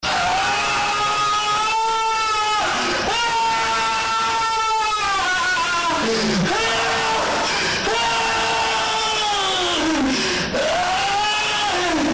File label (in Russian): muzhik krik